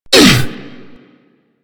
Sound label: Hammer Boss Pain, Vocals; Warframe